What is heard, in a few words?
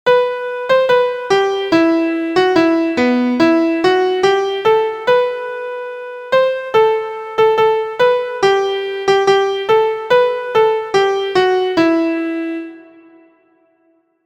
MIDI